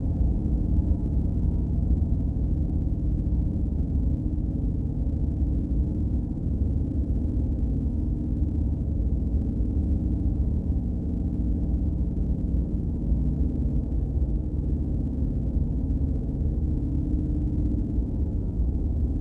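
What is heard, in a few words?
large hangar ambience; Star Wars Obi-Wan; XBOX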